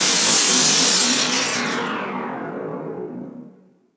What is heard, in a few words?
Downlifter